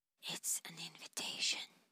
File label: vocal dry